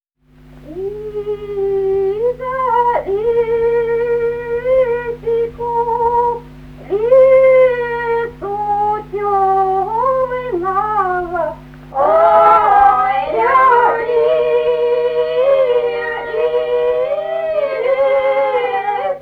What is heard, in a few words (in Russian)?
карагодная; Из-за лесику, лесу тёмного